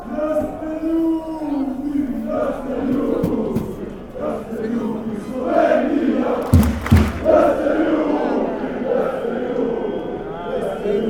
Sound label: slovenian chanting